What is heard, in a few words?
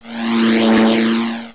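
SMALL PLANE, war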